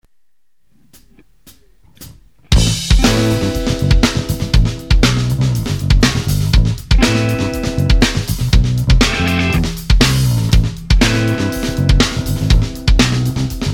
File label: Instrumental